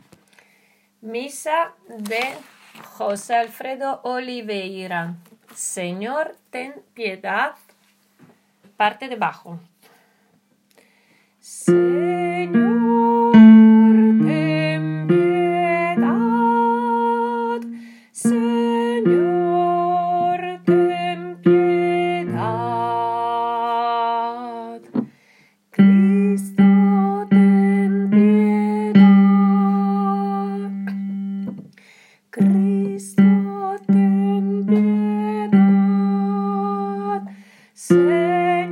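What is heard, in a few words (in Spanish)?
bajo tenor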